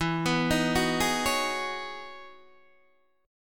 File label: E Major 13th down Guitar DADGAD